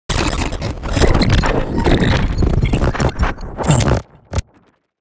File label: portal glitch